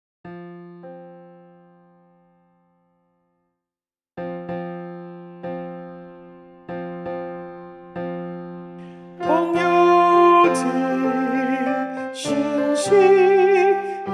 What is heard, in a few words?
Alto